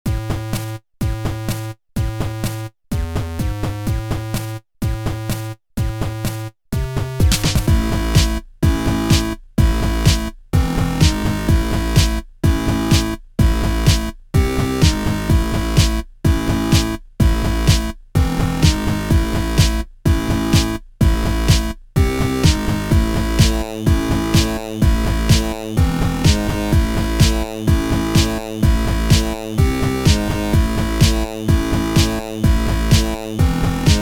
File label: digestive tract